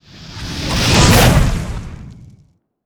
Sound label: fireball cast